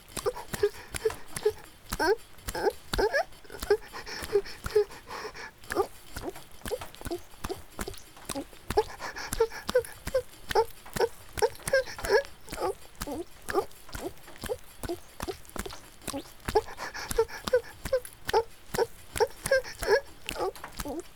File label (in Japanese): ギシアンループ, 雨降り; 田舎の幼馴染はからかい上手